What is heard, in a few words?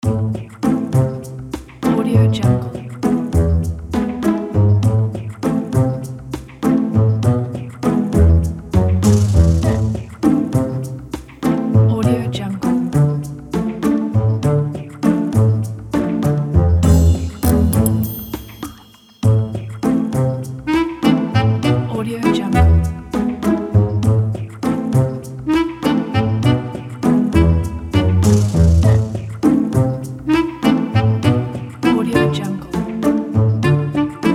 sneaky humorous